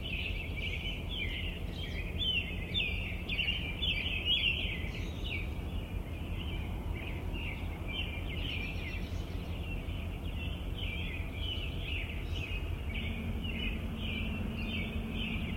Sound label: birds in a city, ambience; NS Studios - ultra sound collection